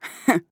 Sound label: Laugh; ChurchHill; Paladins